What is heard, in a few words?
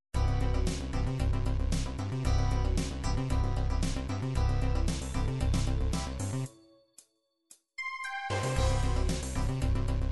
16 bit 16beat pop, midi